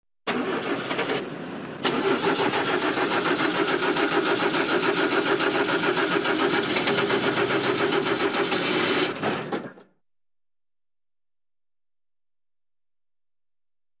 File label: Old Car Engine Hard Start